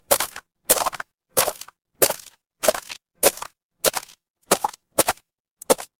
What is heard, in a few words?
footsteps gravel